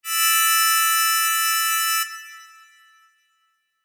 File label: Sho